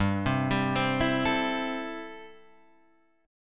A-Minor 7th G

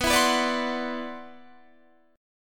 C-Major Sharp 11th B, guitar

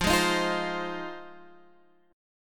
F Minor 7th F Guitar Half Step Down

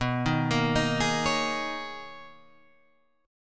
B Major 13th B Guitar Half Step Down

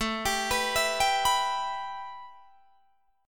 A 7th Suspended 2nd A, Guitar DADGAD; A7sus2; arpeggiated

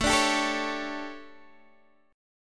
D Major 7th Suspended 4th, chord

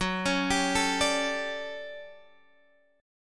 D Major 11th Gb, chord

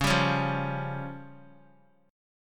Db Major Flat 5th, Guitar; strummed; JGuitar

